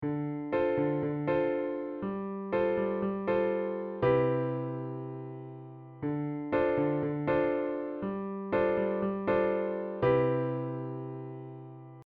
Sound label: Divided Chord Example